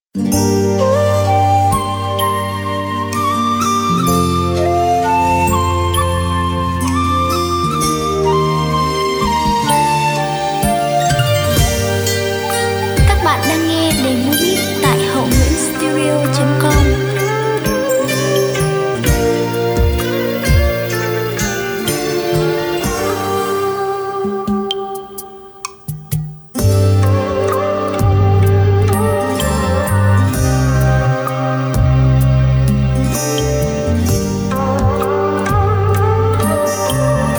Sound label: beat, playback